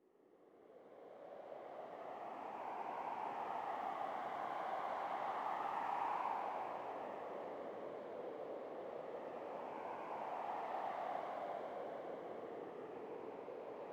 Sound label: sting wind gust; Rust